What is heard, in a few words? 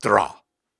Syllables and Imaginary Words, Viking Vocal, Hard